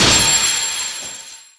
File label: Enemy Active Break